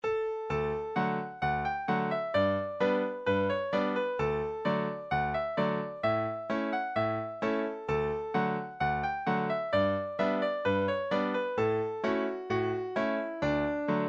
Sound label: New Five Cents 65bpm